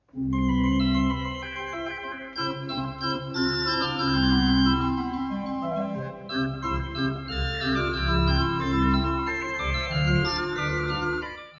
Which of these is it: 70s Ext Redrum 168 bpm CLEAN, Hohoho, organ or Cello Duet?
organ